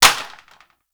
Plastic Drop; Ambience; Gameplay; Warframe; Nineteen Ninety Nine

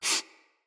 Sniff; Fable Of Garren Rood; Avakot

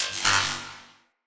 Cannon Tesla Recoil; Weapons; Player

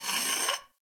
Moa Leg Movement; Warframe; Footsteps

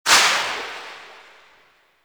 Pistol Silenced Tail; Operators; Drifter

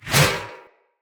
Sphere Board Whoosh; Weapons; Swords; Sphere Sword And Board

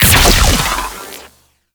2D Shot; Crp BFG

